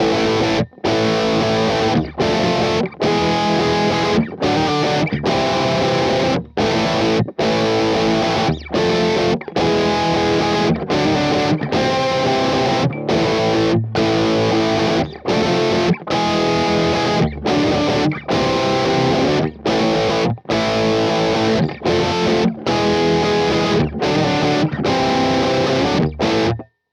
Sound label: Cort KX 500 Bridge Voice